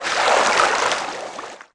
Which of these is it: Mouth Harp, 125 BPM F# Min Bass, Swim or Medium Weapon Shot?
Swim